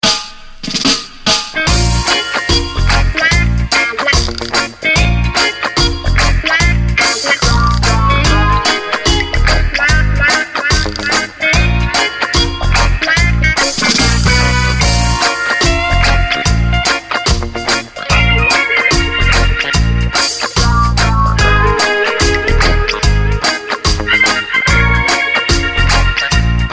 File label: reggae sounds ringtone